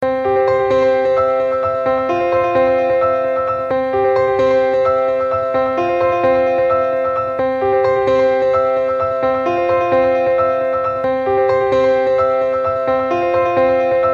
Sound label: piano; نغمة رنين بيانو رائعة